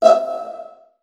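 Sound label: viola pizzicato E1 left, Virtuoso Strings; ILIO; Kirk Hunter Virtuoso Strings VOL-2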